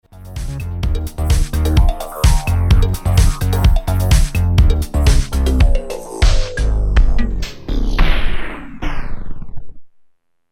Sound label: Break